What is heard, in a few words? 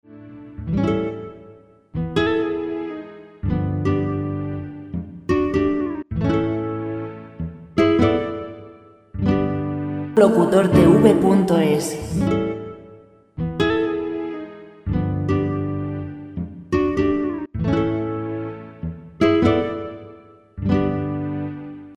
guitar classic 80; Royalty-free guitar music